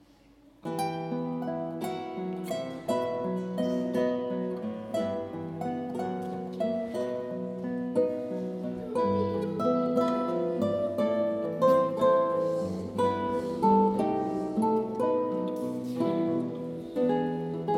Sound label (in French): Guitares Trio